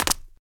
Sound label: concrete land; firefight